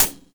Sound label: Track HiHat, drums; Sonic Foundry (Sony Creative Software); Drums & Drones; Construction Kits